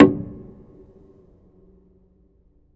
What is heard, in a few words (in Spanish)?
percusion